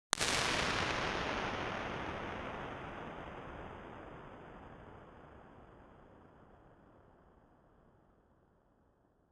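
stereo, impulse response, St Marys abbey reconstruction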